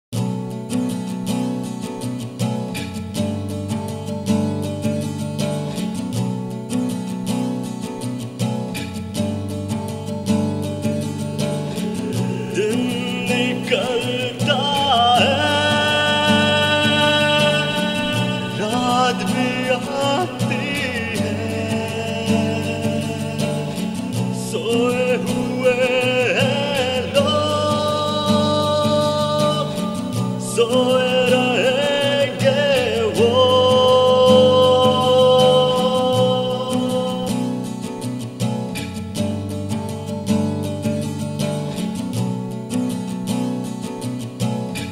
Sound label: Acoustic